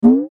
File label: chair jump; Oddworld; Munch